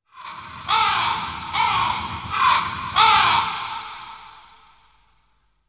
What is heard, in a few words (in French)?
Corbeaux, Le corbeau